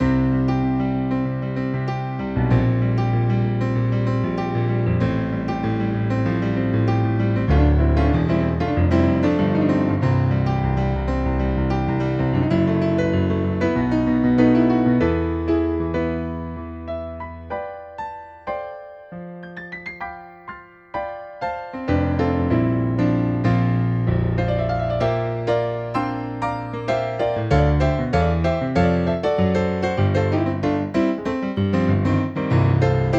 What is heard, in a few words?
grand piano, Yamaha NP-30; Demo Songs